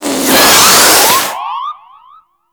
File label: Balloon Inflate; Festivities; Warframe; Follie